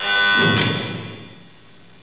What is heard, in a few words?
large beepactivate